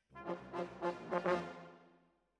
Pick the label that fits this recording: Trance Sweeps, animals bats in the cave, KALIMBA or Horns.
Horns